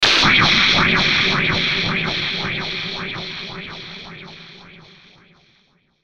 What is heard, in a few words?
Hit Static Wah Swirl; Blastwave